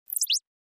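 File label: HF Electronic Pulse Reverb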